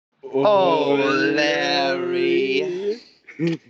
ooohhhh

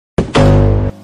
drone defense system

